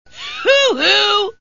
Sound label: Hoo Hoo; Nelson; simpsons